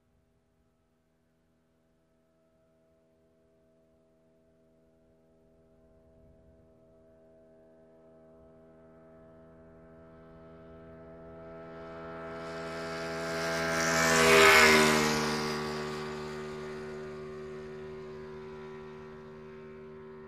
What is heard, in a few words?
Motorcycle passes by quickly